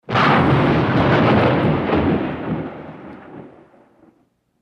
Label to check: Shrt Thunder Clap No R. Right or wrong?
Right